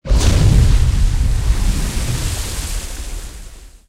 tanks explosion water; War Thunder

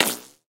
bullet dirt; guns; War Thunder

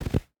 footstep hide snow jump land; Rust

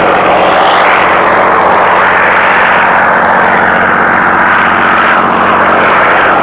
engine bomber rpm far; War Thunder; engines

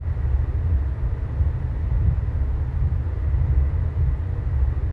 submarines engines; World Of Warships

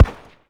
polycraft 3 shot far

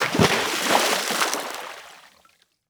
water transition in; Rust

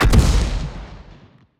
impacts; World of Tanks

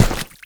muck walk

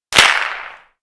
Inf Weapons; Red Orchestra 41-45

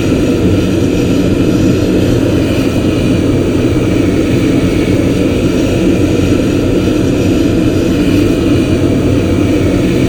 Aircrafts L-39 Starter Noise; DCS

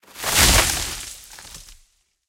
tree collision

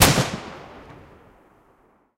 mg 16mm kpvt lastshot

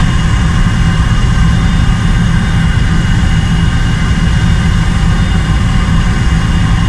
jet cockpit, engines; War Thunder

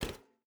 footstep barefoot metal run; Rust

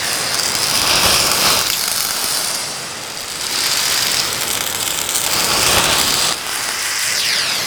flare burn; tacinsert

